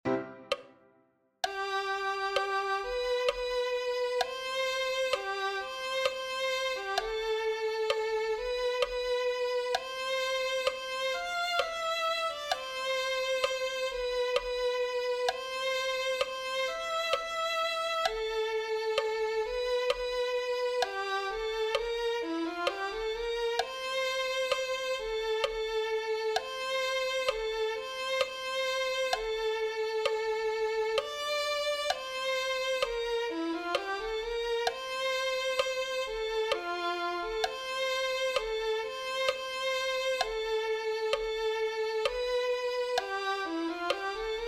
violin

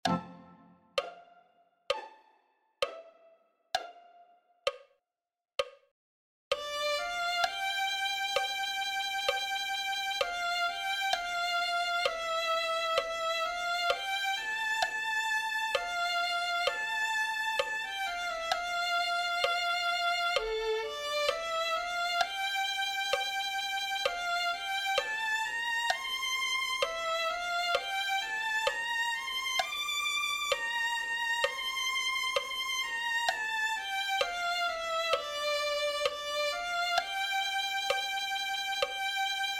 sion dara a luz violin

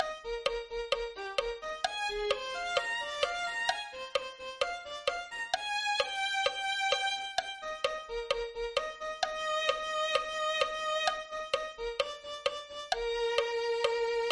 violin

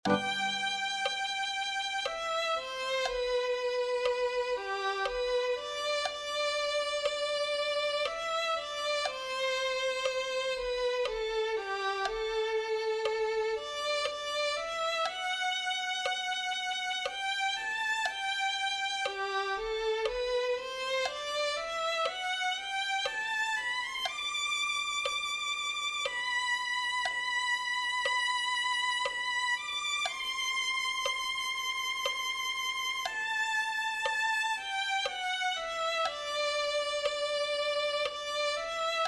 violin

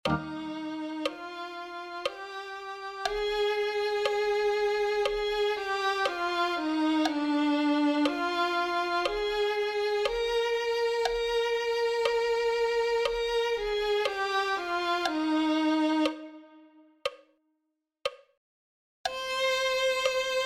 detras del velo violin

